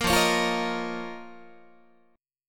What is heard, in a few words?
F 7th Suspended 4th Sharp 5th F down Guitar